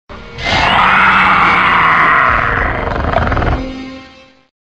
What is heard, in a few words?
raptor sound; raptorize